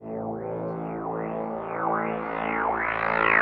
TEKNO 140, Techno; Techno2; Sphere

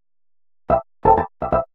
HOUSE FX 125

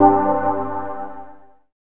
PAD

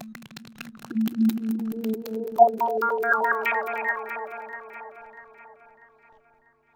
TEKNO 140; Techno

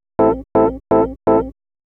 house synth 125 A, keys

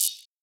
hat; Anthemic

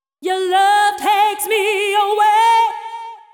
deep house female, 125, A, vocals

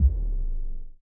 Bass, One Shots; Touch Loops; BlissedOutBeats

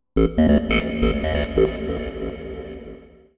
TECHNO EXTRA 140

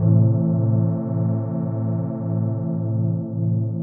DEEPHOUSE ORGAN 125 A

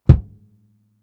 Kicks; Touch Loops; CosmicFunk

